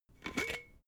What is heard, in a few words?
glass bottle massive open cap short